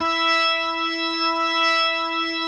E4, B3

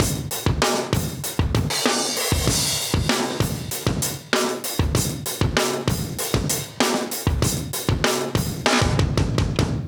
97 Red Ride Break, breaks, Live Breaks

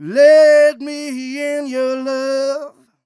m 127 mel a, Vocals

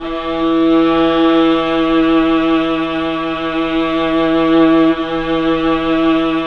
VIOLA, 3D Pads; 3D Multis

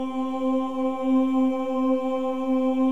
CHOIR OOS, C4; Spectrasonics; Symphony of Voices

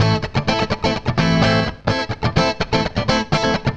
FUNK, FUNKY GTR

